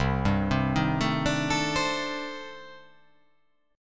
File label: C Minor 6th Add 9th C